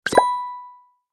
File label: Flash sale pop up; black friday